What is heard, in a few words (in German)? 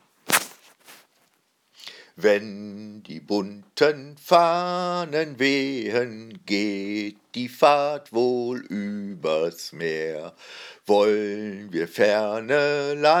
Gesang